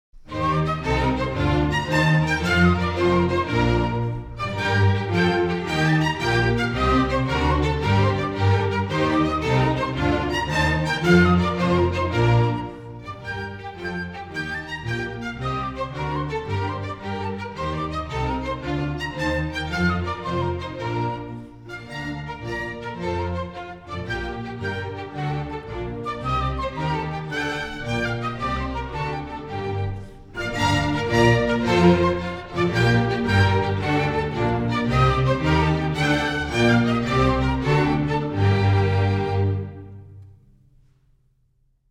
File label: Centre practice Country Dance, exam music